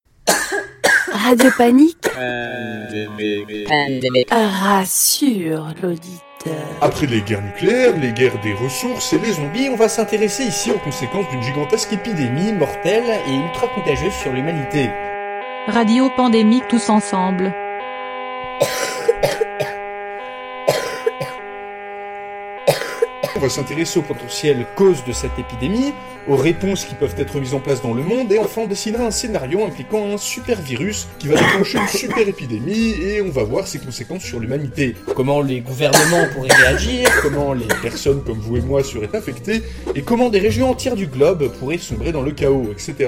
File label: jingle; pandemik